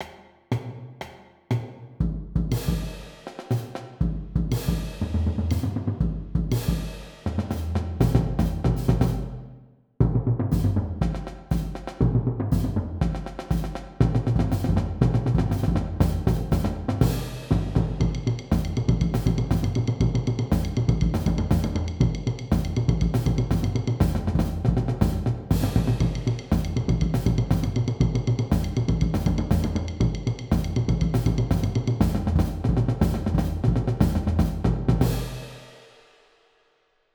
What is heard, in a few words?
jams (full)